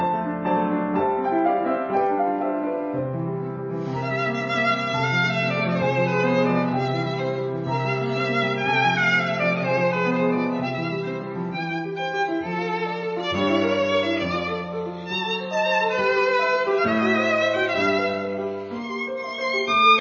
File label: Sonatine Allegro moderato; SONATINE N2D.835